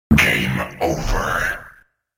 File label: Game Over